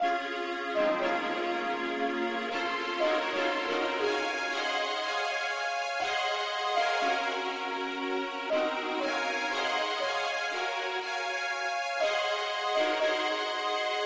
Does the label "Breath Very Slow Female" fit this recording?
No